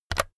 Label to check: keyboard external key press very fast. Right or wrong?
Right